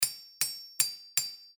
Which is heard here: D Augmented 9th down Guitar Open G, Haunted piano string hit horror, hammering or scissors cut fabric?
hammering